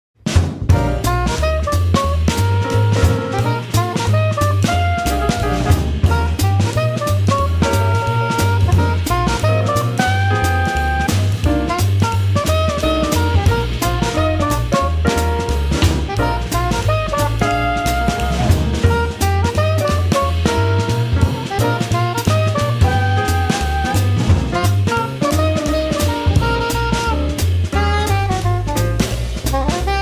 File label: Jazz Quartet Blues